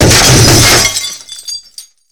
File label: Heavy bursting glass sound